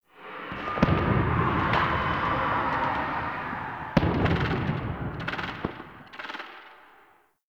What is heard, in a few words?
Ambient Distant Infested Explosions; Nineteen Ninety Nine